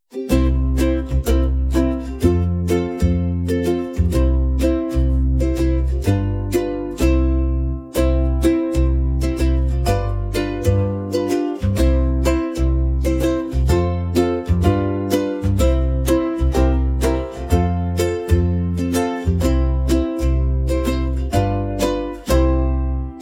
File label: Backing; jardim infancia